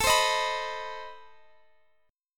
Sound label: C Minor Major 7th, A, guitar chord